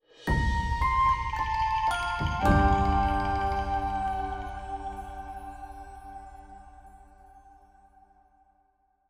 complete Quest Stinger G

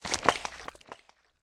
Car Oil; Smashy Road Arena android ios 2016

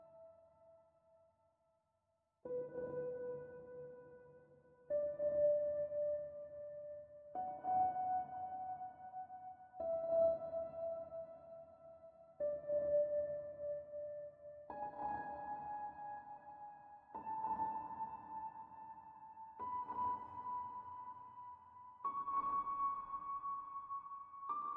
MainMenu Loopable

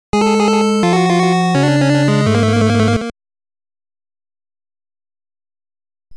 Death; the bugs bunny crazy castle nes

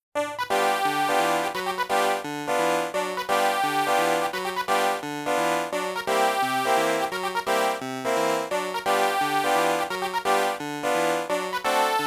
Mood Organ

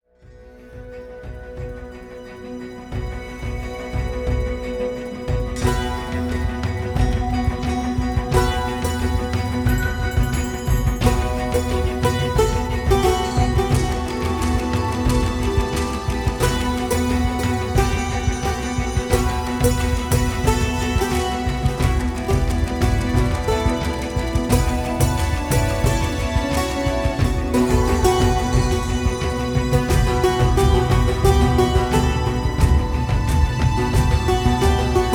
Ethno Theme Summer Full Live; ryse son of rome 2014

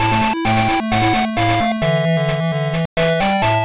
Failure Jingle

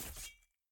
Attack Spear

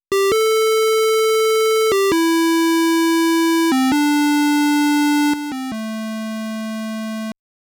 Shakuhachi

